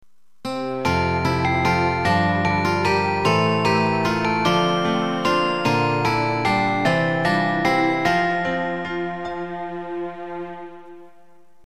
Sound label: game over; SC-8850; MIDI